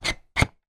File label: industrial lever pull plastic